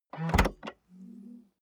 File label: car bmw e46 exterior trunk open